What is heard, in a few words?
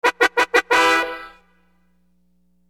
Horn Loop, Hip-Hop